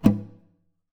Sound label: Classical Guitar Extended Technique Plink